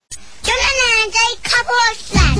jingle; couple of slags

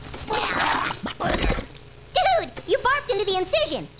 barfed

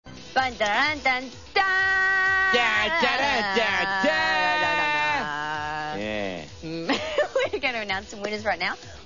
TADA

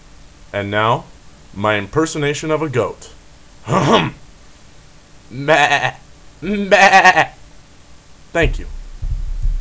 impersonation of a goat

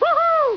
woohoo; (Homer)"Woo hoo!"; Simpsons

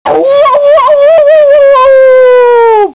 howl; Sound of Tam Tam howling; Temple of Samurai Shodown

